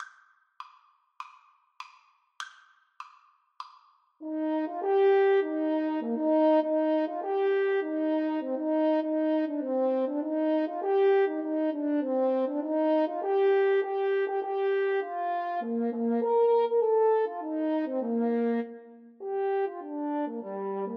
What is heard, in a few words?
good time HN duet, French Horn Duet